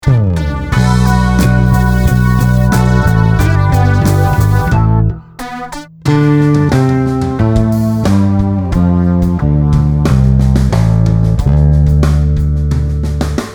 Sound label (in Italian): BASSO ELETTRICO; DEMO CORSO